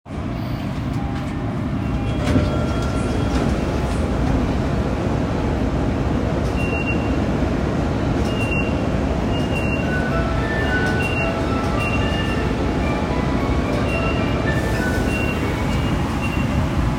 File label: train sounds; 日比谷線入谷北